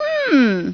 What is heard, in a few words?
humm; BattleSNDS